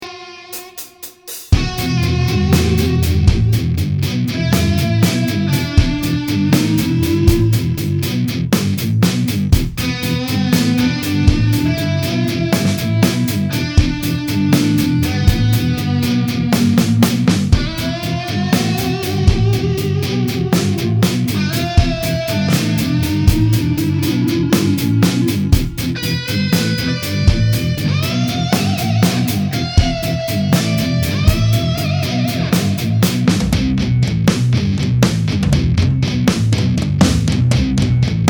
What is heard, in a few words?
Guitar Test